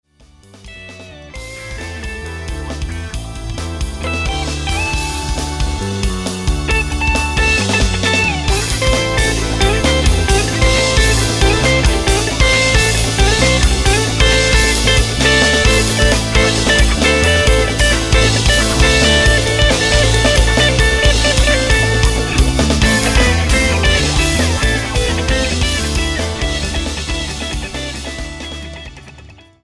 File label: High Drive